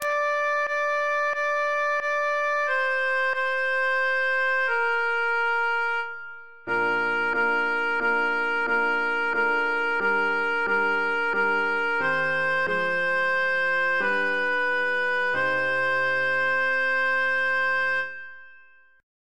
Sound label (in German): sopran